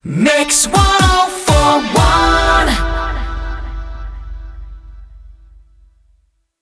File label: 124bpm e Rapid Fire; WBMX 2017